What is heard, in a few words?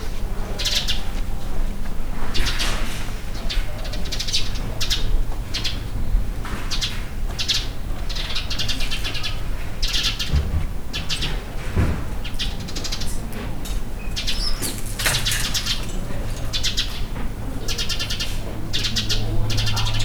bird